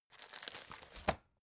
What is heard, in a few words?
folder file jacket turn